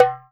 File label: Dumbek Tone, drum machines